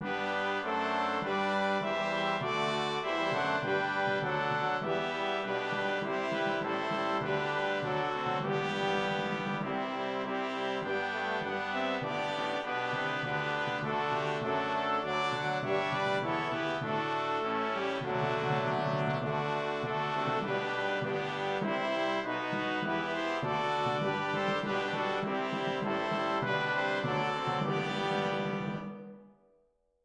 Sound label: BRASS